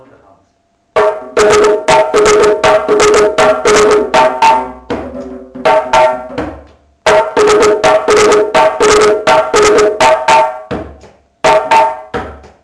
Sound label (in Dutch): SOLI Break Var Traag